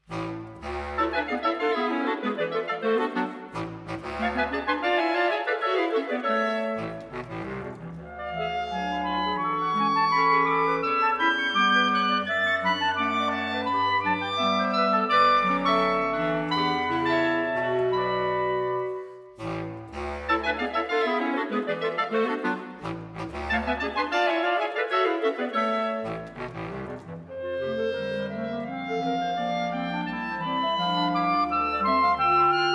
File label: Clarinet Sextet; Movt 4 Finale